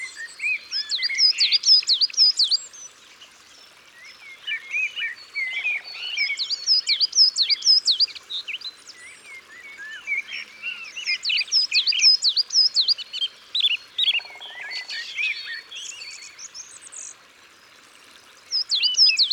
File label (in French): Mesange noire; Isere; Concerts naturels de l'Isere; Studio Les Trois Becs